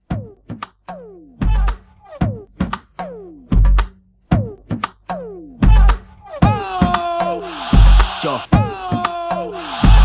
Club 114 CLEAN

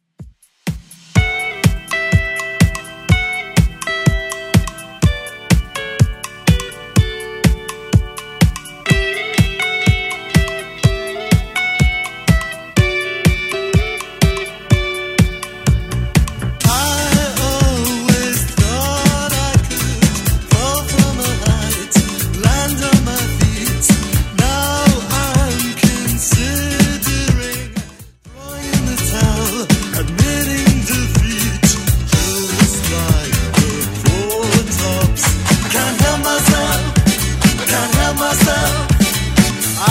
80s 124bpm; Redrum

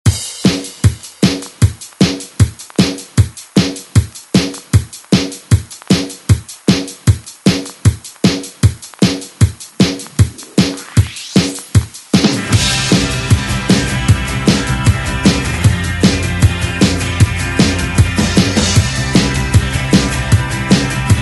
80s redrum; DJ Mhark

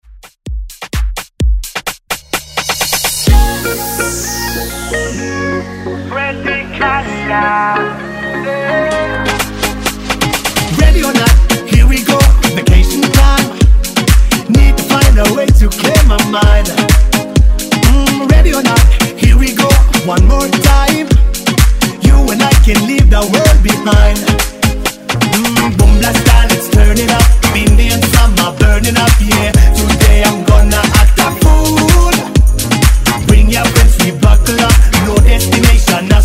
House Redrum

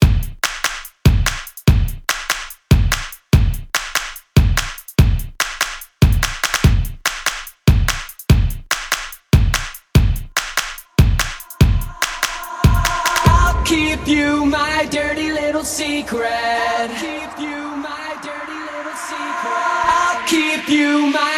Epic Intro

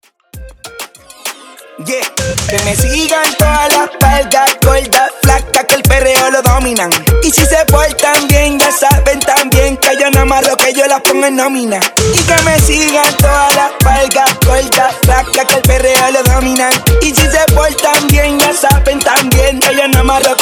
Latino Moombah Hook In Outro 98 BPM

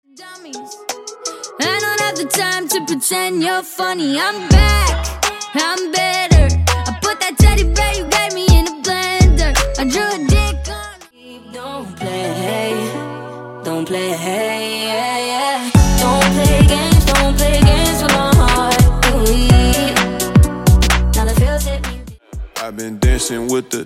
Remix Collection Megapack

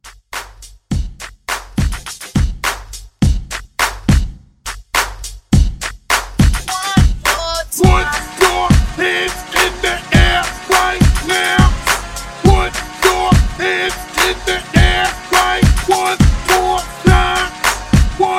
Hype Re Drum 104

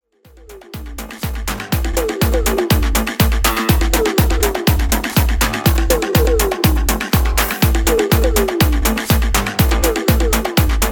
Classic Mix 122Bpm; Club

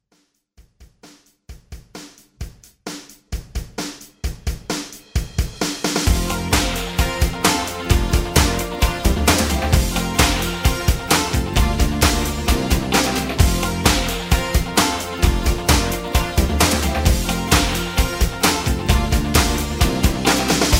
80s Pop Rock Redrum

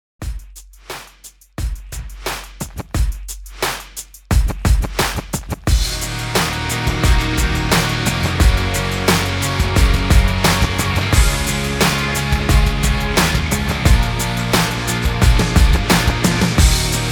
REDRUM 88 BPM

